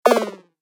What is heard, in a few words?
Retro Gaming Sound; Video Game Sound Effects